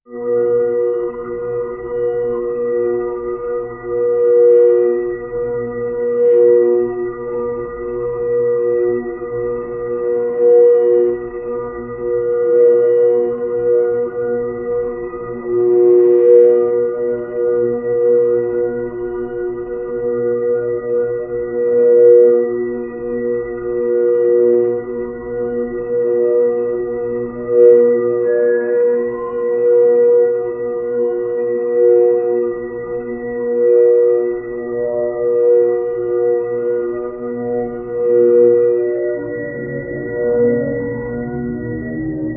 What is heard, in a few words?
horror slow hospital alar, ambient